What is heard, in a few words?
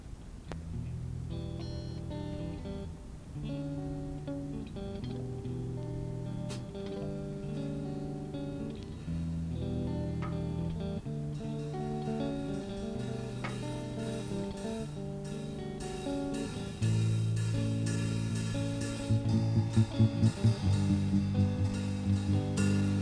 Blues